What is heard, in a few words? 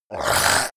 zombie grunt choke male short; kreaturen